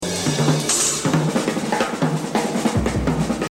Jazz Drumming

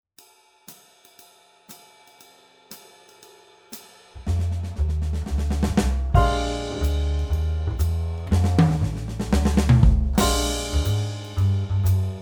Swing, Drum Fills; Essential Drum Fills; Erskine

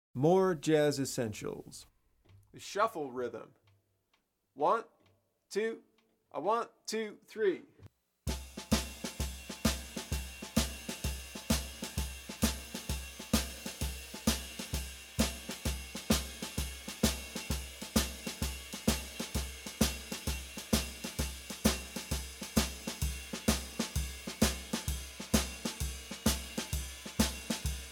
The shuffle, Bop Drumming; The Art Of Bop Drumming; Riley

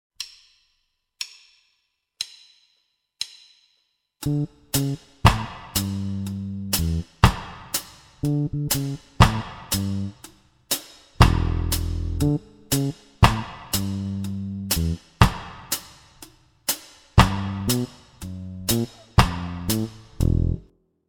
ONE DROP, Batterie Reggae; Buchmann; Batterie Reggae VIbrations

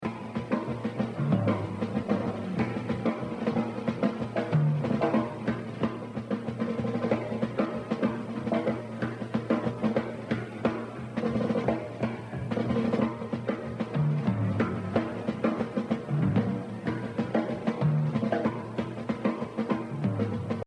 Exercise, jazz drumming

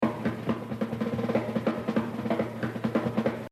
Jazz Drumming; The Evolution of Jazz Drumming (Gottlieb)

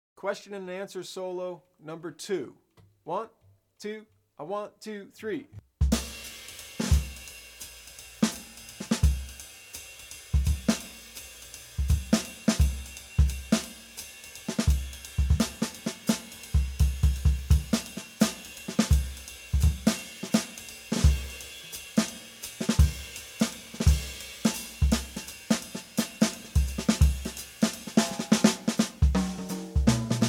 Question and Answer Solo, Bop Drumming; The Art Of Bop Drumming; Riley

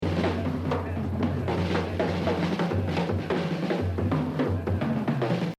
Exercise, jazz drumming; Art Blakey; The Evolution of Jazz Drumming (Gottlieb)

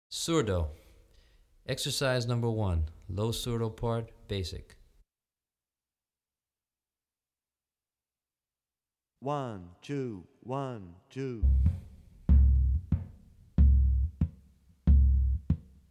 Surdo Drumset; Brazilian Rhythm For Drumset; DaFonseca, Weiner

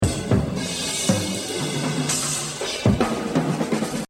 Exercise, jazz drumming

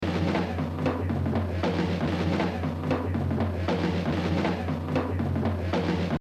Exercise, jazz drumming; Art Blakey; The Evolution of Jazz Drumming (Gottlieb)

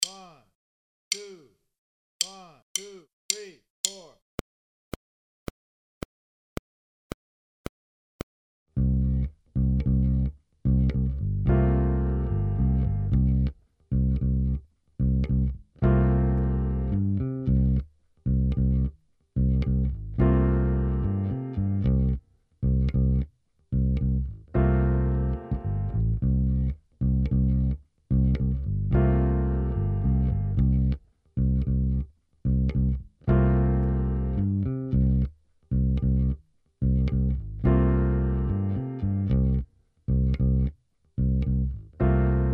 Pop, Drum Fills; Essential Drum Fills; Erskine

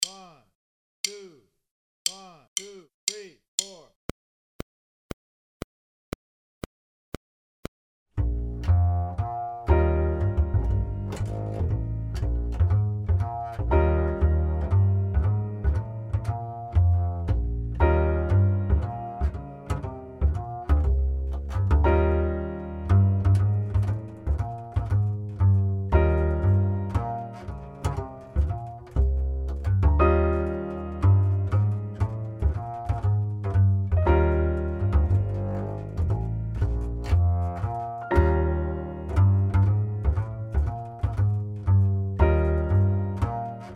Swing, Drum Fills; Essential Drum Fills; Erskine

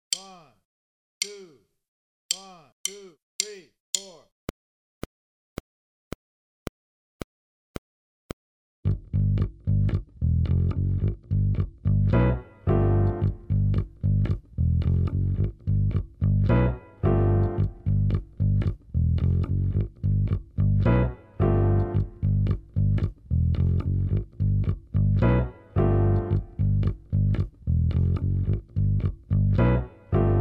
Pop, Drum Fills; Essential Drum Fills; Erskine

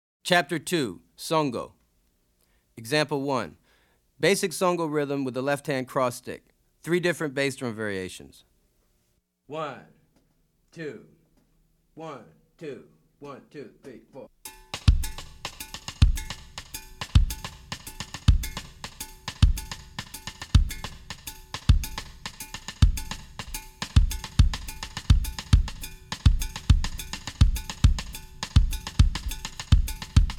Afro-Cuban Grooves For Bass And Drums

